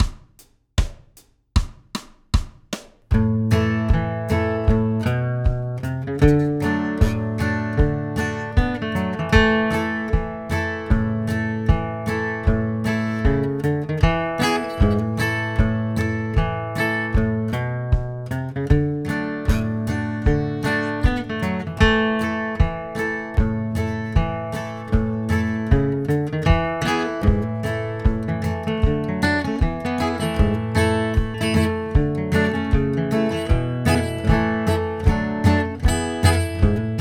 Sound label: rhythm, more BG rhythm guitar ideas G; Bluegrass Guitar